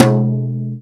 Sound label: Brush Tom; Mo' Phatt; Digital Sound Factory Mo' Phatt